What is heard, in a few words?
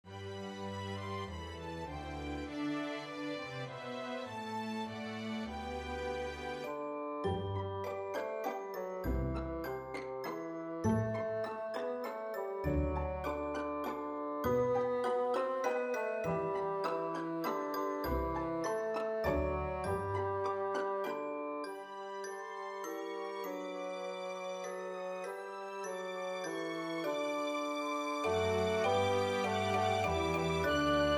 Mini Bassoon Track with Melody; We Wish You A Merry Christmas